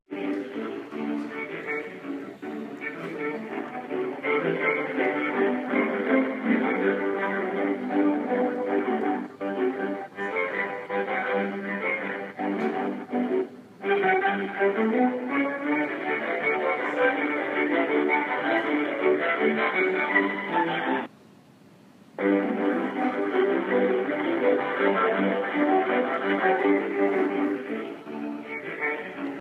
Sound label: Hold Music